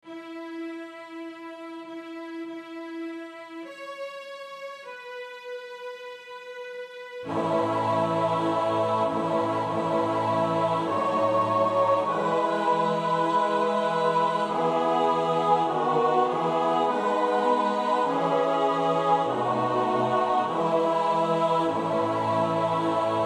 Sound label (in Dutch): God be in my Head (S-A-T-B) zonder slurs, Oefenbestanden; Walford Davies (Henry)